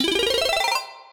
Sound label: win; Jungle Treasure